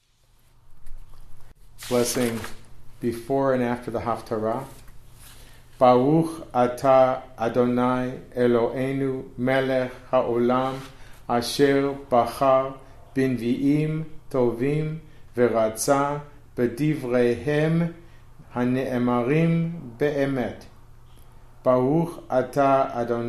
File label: haftarah pre; Shabbat Morning Readings